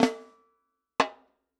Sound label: SnareDrum; 膜鳴楽器; 打奏; SnareDrum-OpenRolli